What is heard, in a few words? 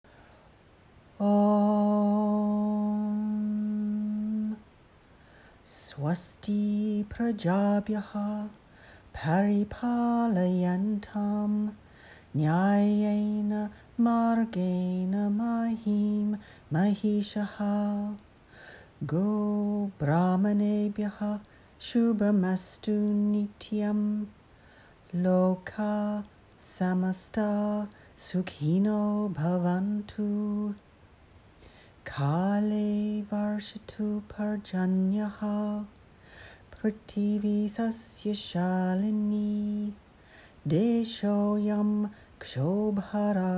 Closing Prayer, yoga; Perth Ashtanga Yoga; Practice Tools